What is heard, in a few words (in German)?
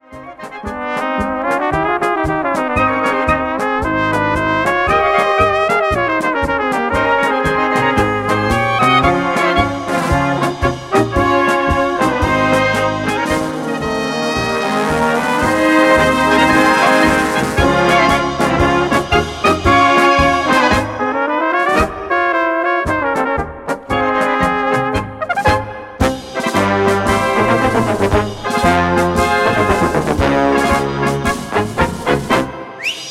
Blaskapelle